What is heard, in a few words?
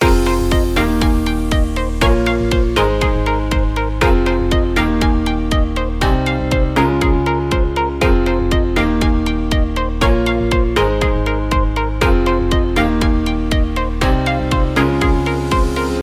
Future Pop; MIUI12